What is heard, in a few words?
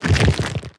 step movement, zombie blind, foley; creatures